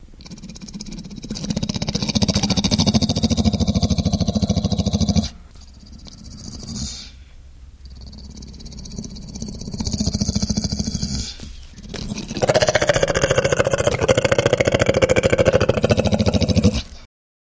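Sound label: Throaty Growl Saliva, vocal elements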